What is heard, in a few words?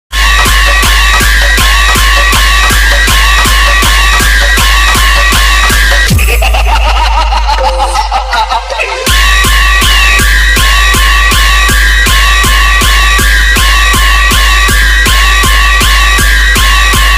Trance Dailouge Compition Mix; Sitti Siron